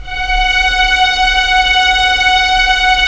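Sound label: Strings Violins; Roland - LA Composer vol. 4; 90 s Sample CDs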